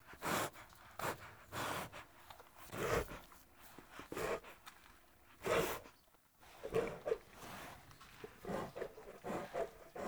Wolf Breathing Jog Loop; Animals

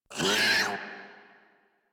Kavat Vocal Pain; Warframe; Khora

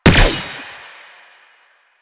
Gunbrella Bullet Deflect, Weapons; Warframe; Tenno; Koumei

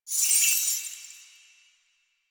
Naginata Whoosh Tonal; Weapons; Melee; Warframe; Koumei Naginata

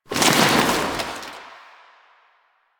Fabric Chair Destroy; Warframe; Ambience; Entrati

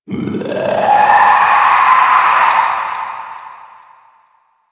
Infested Runner Dist Vocal; Warframe; Runners

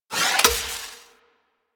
Shotgun Fire Stop Mech E; Weapons; Warframe; Tenno; Alchemist

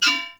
Melee Impact Metal; Warframe; Weapons

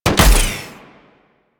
Shot, Weapons; Warframe; Tenno; Miter

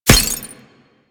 Helmet Impact; Warframe

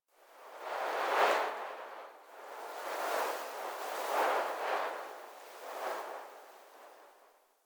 Wind Gust, Ambience Plains

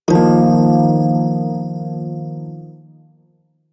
Prime Shawzin Blues Chord E; Music; Warframe

